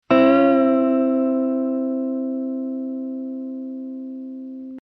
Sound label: Cartoon Guitar Bend